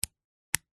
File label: technology studio speaker active power switch click; zapsplat